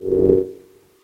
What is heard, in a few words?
Slide